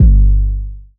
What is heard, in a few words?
808 G; Cymatics; Oracle Sample Pack; 808s